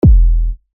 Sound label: BD Stomp; big room